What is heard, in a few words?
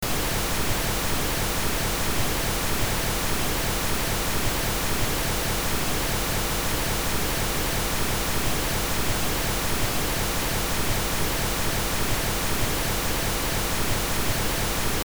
pink noise